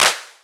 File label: Clap Flange Hint; One Shots; Drums; Ableton Live 12 Lite; Core Library